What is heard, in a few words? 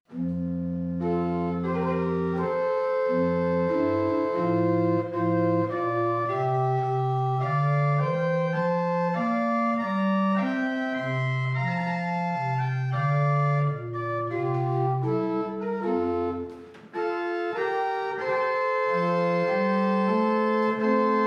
Larghetto; Handel